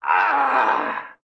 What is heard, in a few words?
grunt cartoon short grunt human grunt carto; strain